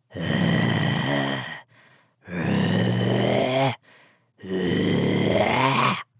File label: Zombie Creature